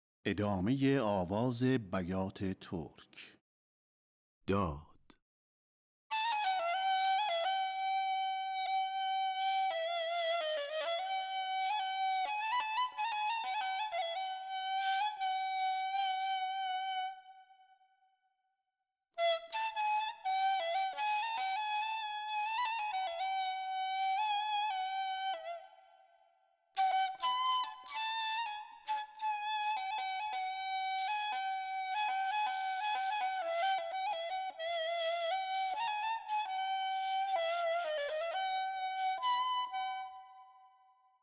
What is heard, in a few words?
Daad Bayat-e Tork Radif-e Ney; Avaz-e Bayat-e Tork